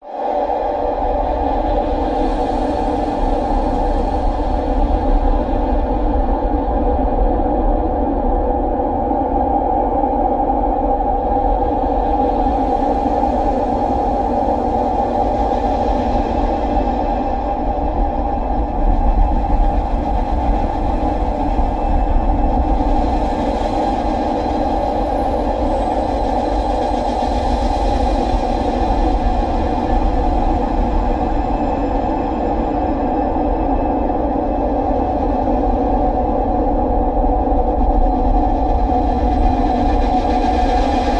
science fiction space vortex atmosphere large spacious howling slowly; Audio Mangler; rotating